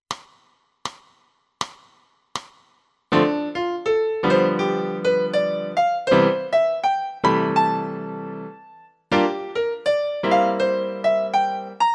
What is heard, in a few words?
piano